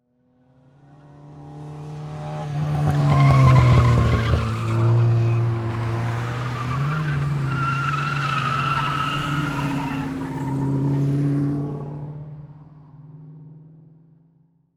Transportation Car Race Car Drive By From Tower Tires Squeal Multiple Cars